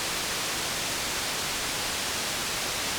24dB, cutoff 8, res 0, Q150; Q150 slope jumpers

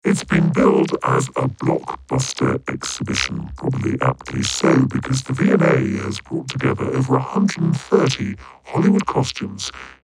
vocoder

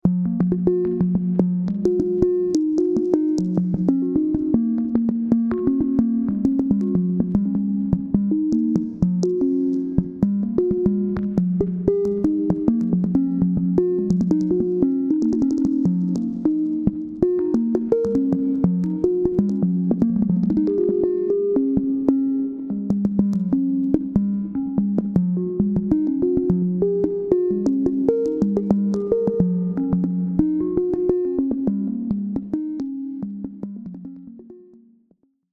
Kalimba

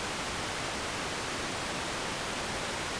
12dB, cutoff 6, res 0, jumpers 2, 5, Q150; Q150 slope jumpers

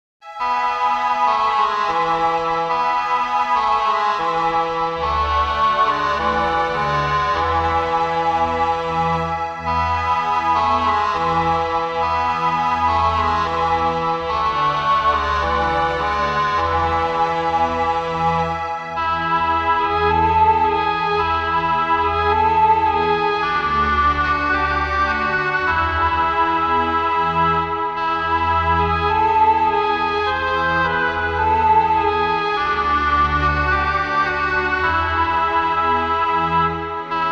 East oboe, Mellotron

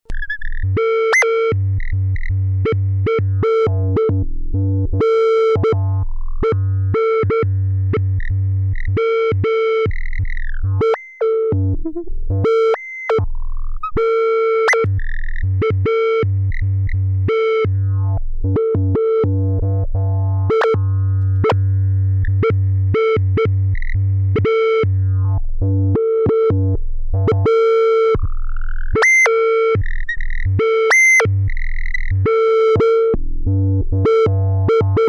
psycho lfo

